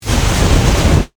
Flame Hand Booster, Scifi, Flame, Hand, Booster, Long, Burst, Science, Fiction; Spacecraft